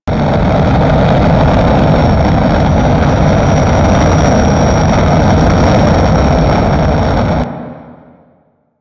Movement Turn Low Loop, Vehicles; Warframe; Archwing

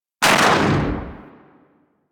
Briefcase Launcher Shot B, Weapons; Warframe; Corpus

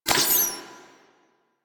Puzzle Symbol Switch UI; Venus Kahl; Warframe; Quests; Veilbreaker

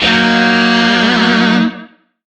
Shawzin Blues Chord; Warframe; Lizzie Shawzin

